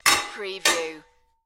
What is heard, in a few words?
Swords Clang